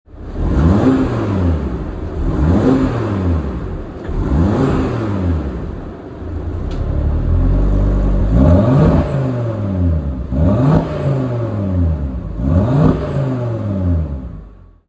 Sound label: revs, audi rs3; carbon vorsprung; ascari blue